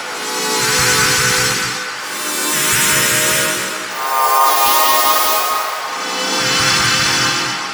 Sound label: Atmosphere Cm 124; Transport Array